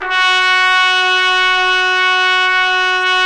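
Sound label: Solo Brass trumpet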